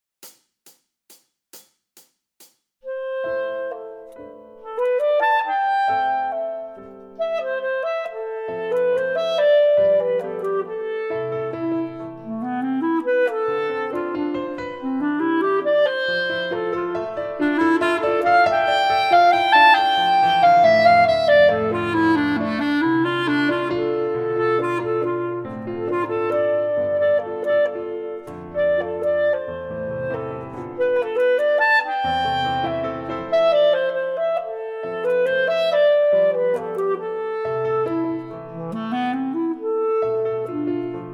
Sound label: Full Clarinet